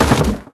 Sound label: Hold the door Door hit